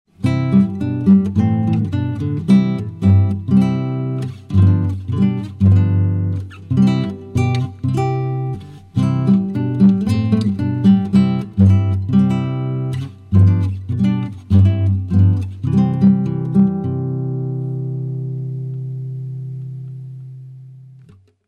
Timbre Classical Guitar Arrangement

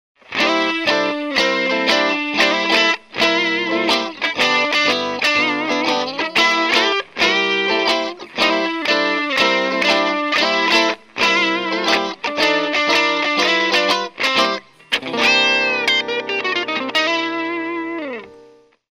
Timbre Blues 2 Guitars

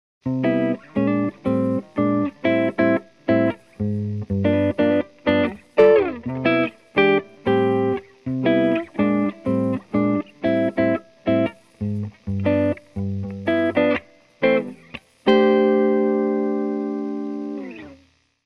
Timbre Jazz Solo

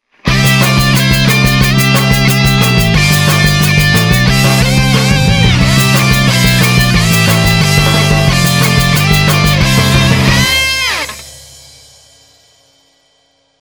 Timbre Rock Arrangement

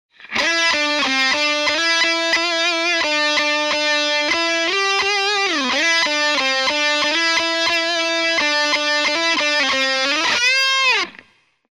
Timbre Rock Melody

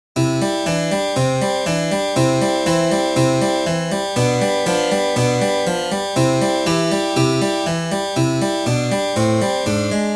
Timbre Harpsichord Arrangement

